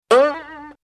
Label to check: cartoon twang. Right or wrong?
Right